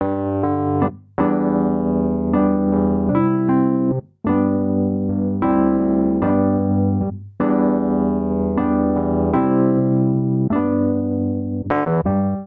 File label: Progression Pop Gospel I - vi - IV - I G Major 77 bpm, Electric Keyboards; Ableton; Factory Packs